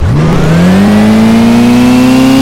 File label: 1st gear, vehicles